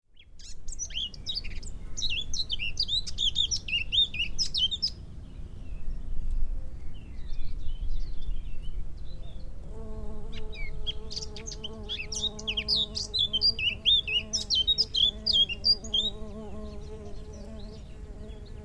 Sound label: sylvia atricapilla